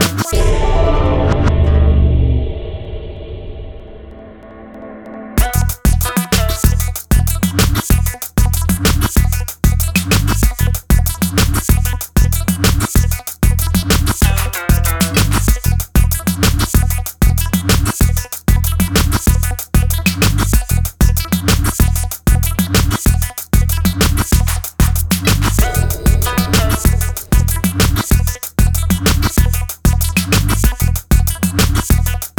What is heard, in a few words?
IQ Series Limiter V2 MXD A electronic 4x; HOFA